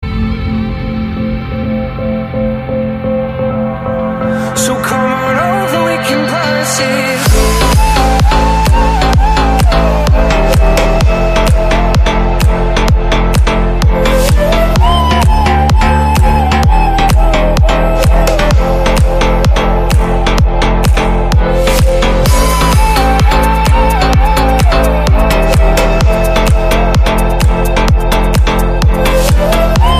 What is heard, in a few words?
Dance Tune